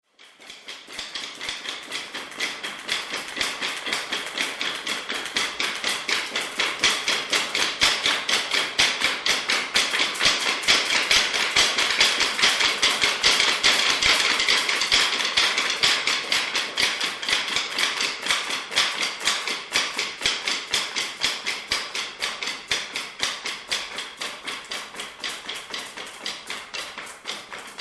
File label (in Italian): PIANO FORTE LENTO VELOCE